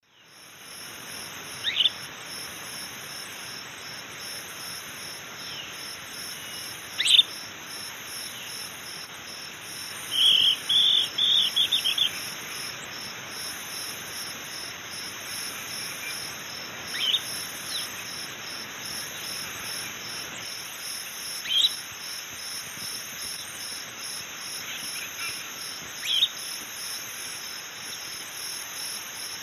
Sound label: Elaenia gigas, dawn song; S. America; Eastern Lowlands